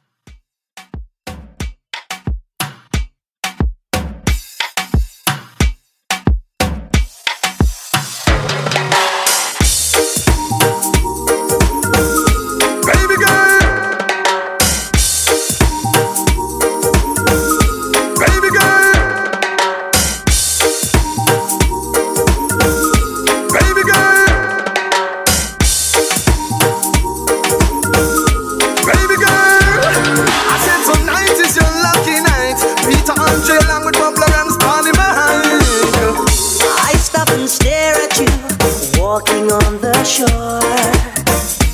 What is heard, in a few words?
90s Pop Moombah, 90 Bpm